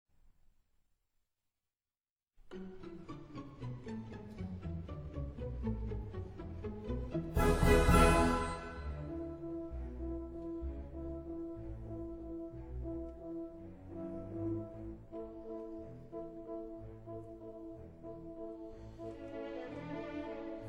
swan waltz

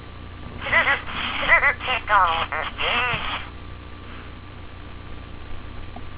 tickle; furby being tickled

